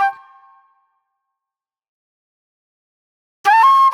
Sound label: Jammy Flute